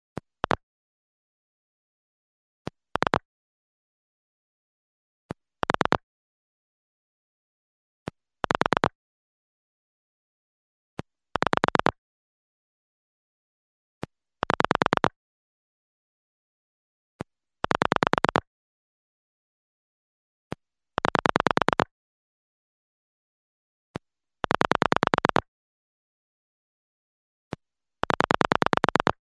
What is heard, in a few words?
Pulse dial sounds in the handset; telephone